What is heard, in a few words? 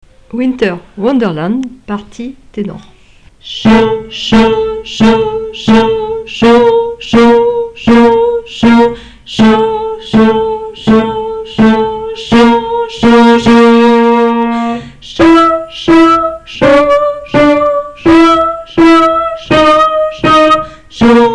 Tenor